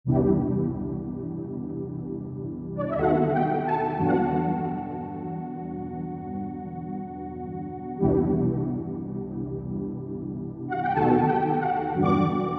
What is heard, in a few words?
Serum Chords